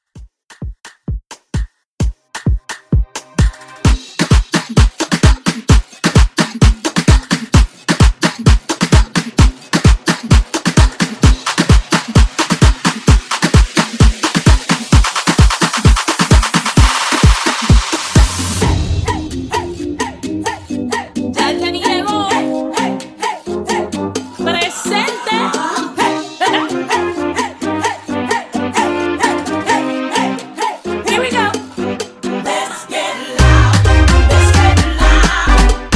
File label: Acap Mashup; Remixfreakz